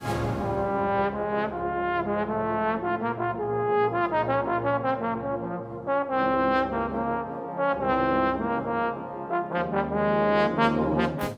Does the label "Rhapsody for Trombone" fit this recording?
Yes